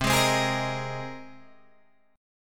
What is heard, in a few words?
C Minor 7th Sharp 5th C Guitar DADGAD